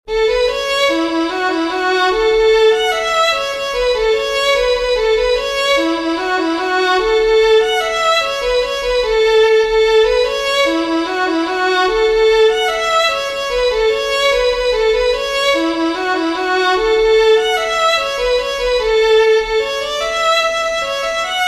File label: HUNDRED PIPERS JIG, fiddlers; southern kings fiddlers